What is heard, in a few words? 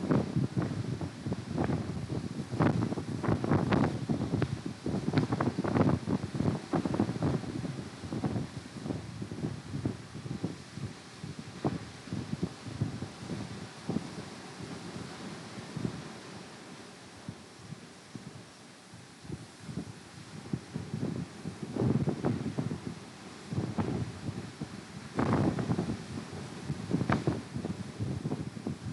ambience